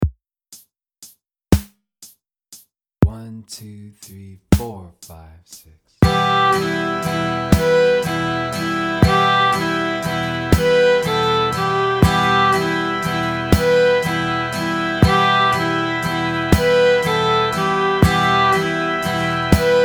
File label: swallowtail jig